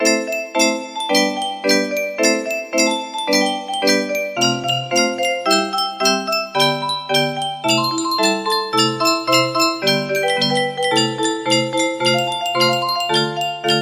music box

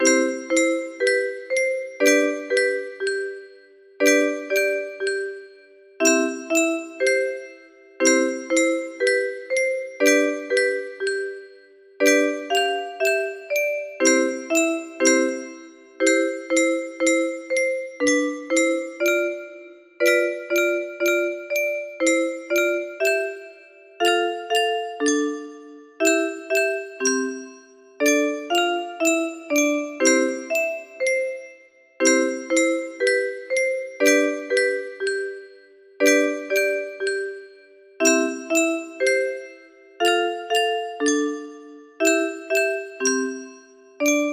music box

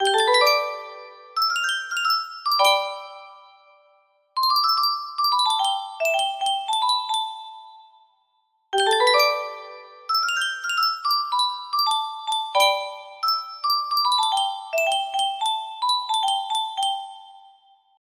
music box

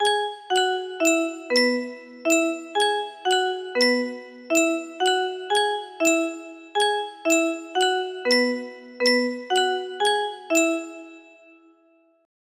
mechanical clock westminster quarter chimes

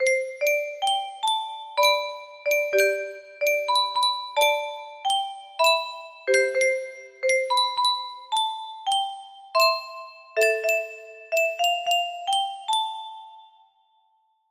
melody, music box melody

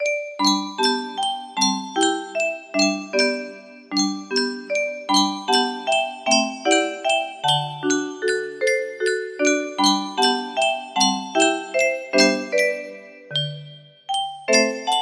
music box

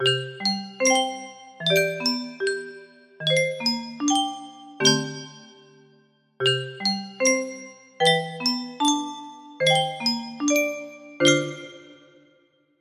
good night music box

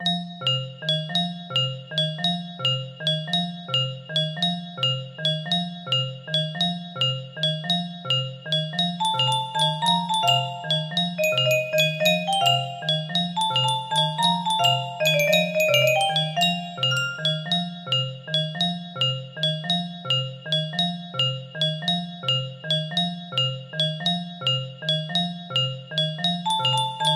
music box

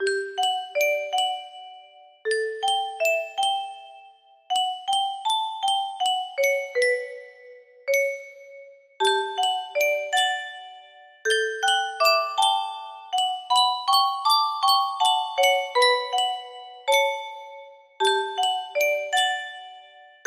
simple melody, music box melody; kanon 2006

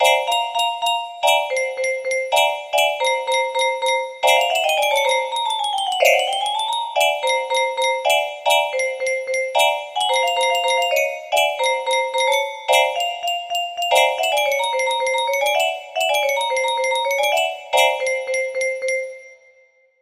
music box

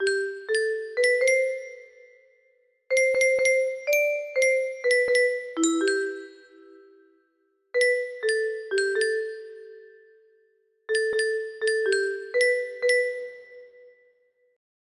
music box

